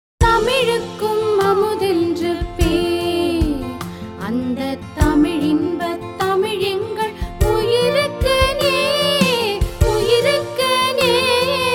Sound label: tamil song